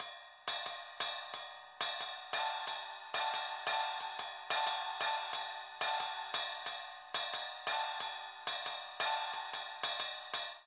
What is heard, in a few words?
Murga B Finger Cymbal 90bpm; Latin Percussion; Ableton; Factory Packs